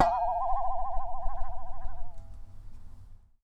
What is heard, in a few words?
Wobble, Japanese Pull Saw